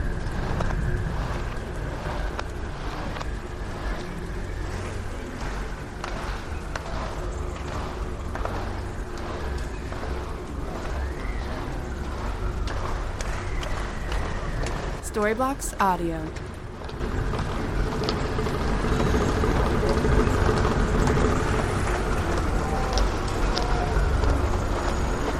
Ambient House Ambience 136BPM A

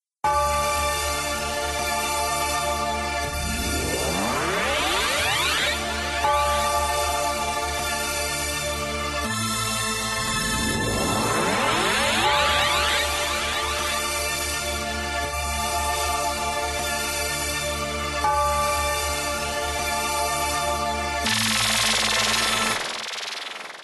the last drive by no vocal

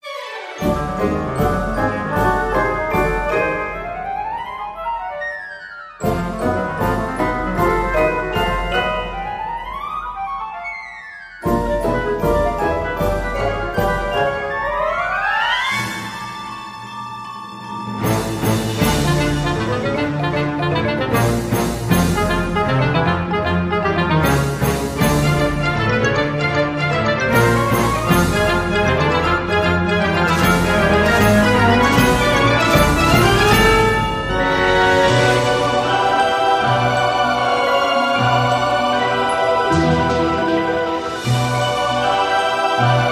cartoon magic sequence

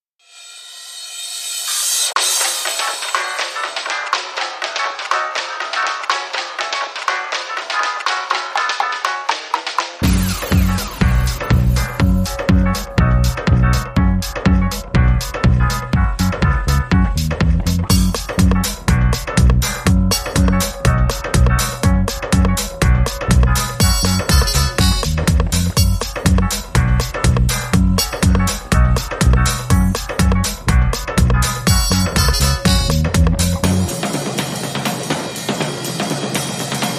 Dance Club Swing Instrumental

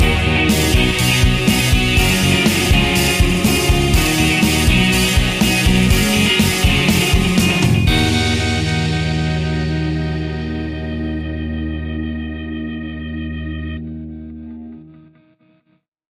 modern indie rock

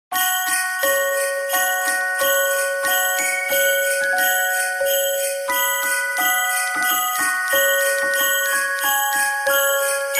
jingle bells short

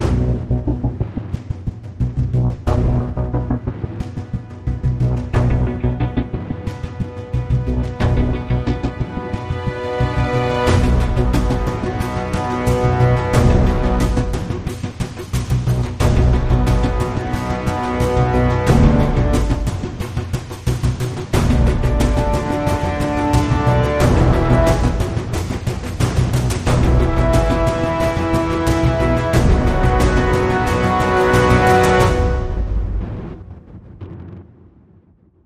Alt Percussion

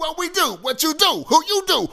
Rap Vox

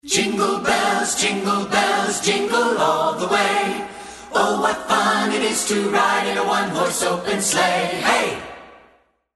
jingle bells

